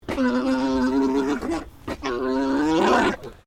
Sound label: Animal Alpaca Male Mating Call